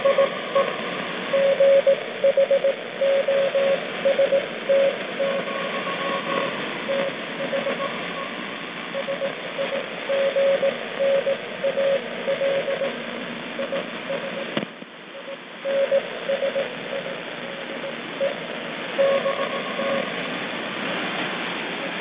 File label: Morse